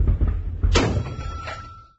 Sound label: mortar reload; Minecraft; Votive 1.21.1; Votive Content